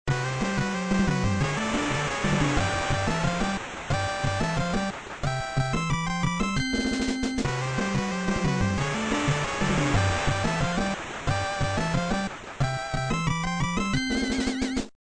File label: Prototype Music Variance; Tecmo Super Bowl